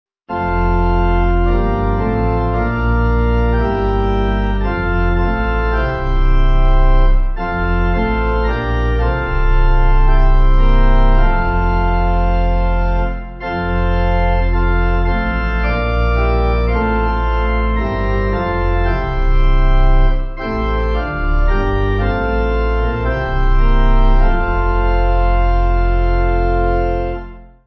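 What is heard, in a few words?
Pipe Organ, Music Backing Files for Churches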